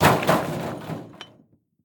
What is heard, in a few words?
garbage smash; quatuor